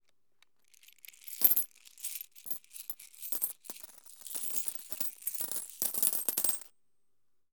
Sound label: coins